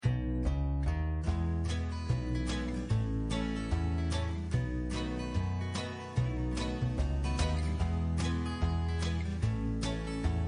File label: BGM Lake Garda